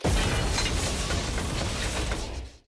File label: reroll outro; loot